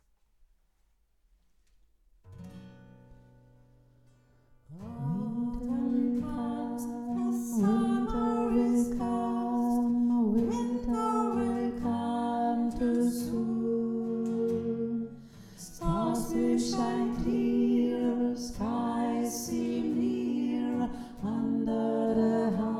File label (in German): tiefe stimme